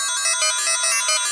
180 frenetic-synth-melody, synth and electronic loops